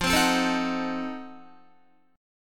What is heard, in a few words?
F 7th Flat 9th F down Guitar; F7b9; strummed